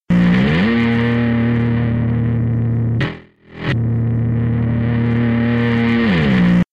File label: bassline; loopzone2